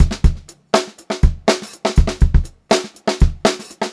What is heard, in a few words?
122 funky beat, funk groove drumloops; USB Soundscan vol.38; AKAI